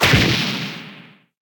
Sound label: punch